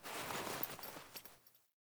Foley Actions Default Wolf Jog Stop; Characters; Player

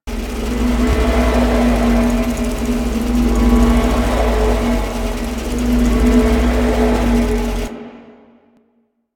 Moon Clock Machine Large Loop; Warframe; Ambience; Orokin

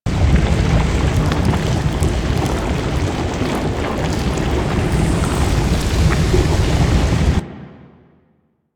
Lava Loop; Warframe; Ambience; Grineer

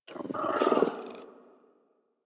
Puppy Interact Affection Closed Mouth; Warframe; Kubrow

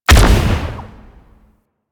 Note Projectile Launch; Warframe; Bard Quest; Bard Boss Fight

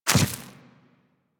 Infested Small Land Dirt, Footsteps; Warframe

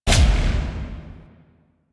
Shotgun Proj Die; Weapons; DE; Warframe; Tenno; Pod Shotgun

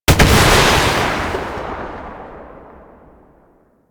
Rocket Launcher Shot; Weapons; DE; Warframe; Tenno; Lasrian

